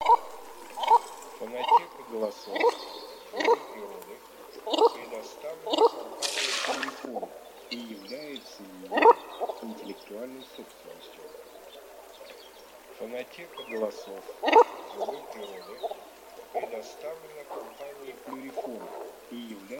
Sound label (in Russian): Клоктун Alzavola Asiatica